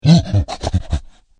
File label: gorilla aggro